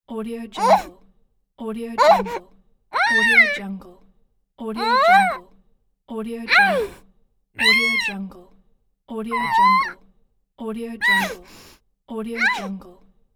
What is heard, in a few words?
woman voice pain muted